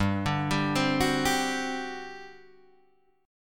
G Major 7th Suspended 4th, G, down, Guitar, Open G